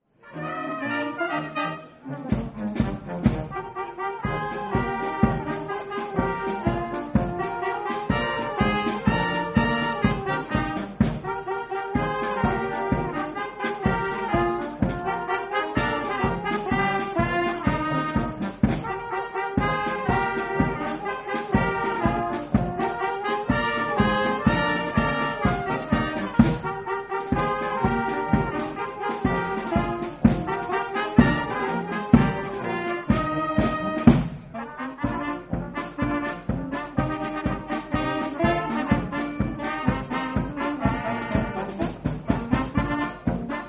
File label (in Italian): banda